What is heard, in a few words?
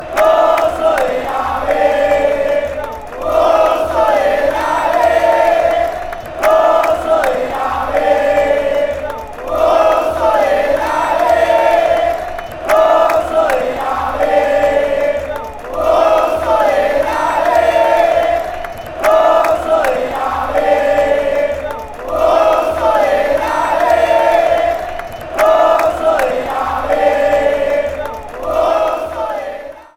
patronato chant